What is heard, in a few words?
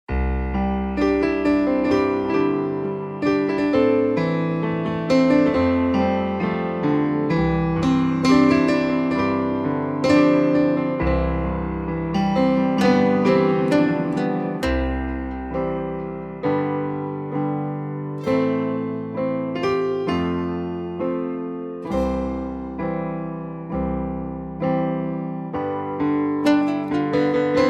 C No Backing Vocals